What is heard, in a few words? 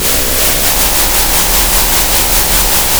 pop, Sound of a train